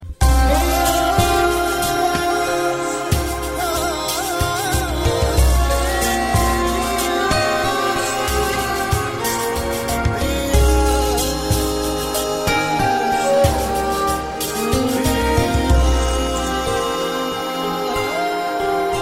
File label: sad ringtone